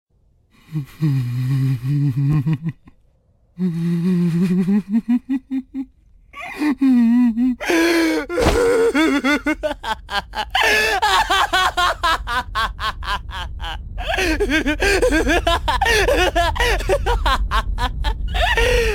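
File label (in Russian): smeh, laugh; Death Note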